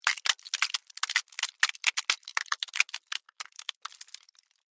Ice Crack Long; Weather; Snow

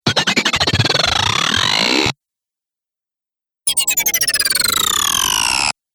2 Sfx Scifi Vibrating Noises; Andrew Fleming

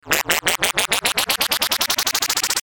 Chopper Ascend Wind Up

